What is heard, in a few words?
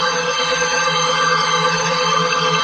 stair laser loop; odin